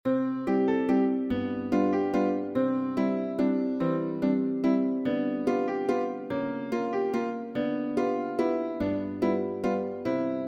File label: Little Concertino No 2 in C Waltz